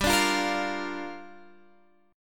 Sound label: Bb 6th G